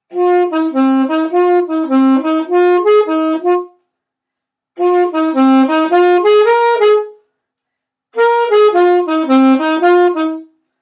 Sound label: shaker